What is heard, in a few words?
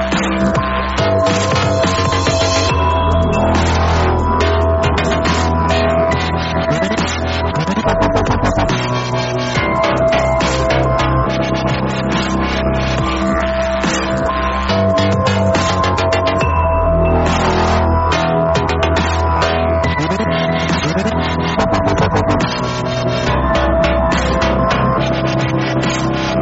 Zombie Dubstep